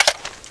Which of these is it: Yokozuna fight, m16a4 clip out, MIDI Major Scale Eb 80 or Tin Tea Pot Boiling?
m16a4 clip out